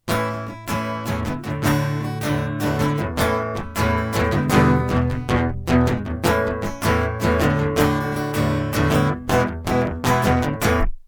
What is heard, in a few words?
acoustic